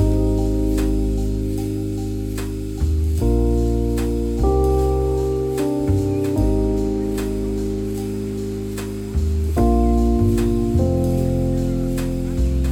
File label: Chillout; Loops; BB 2HTC Samples Vol 4 Addendum